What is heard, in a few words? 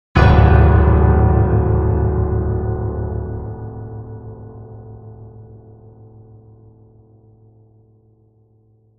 Piano Scare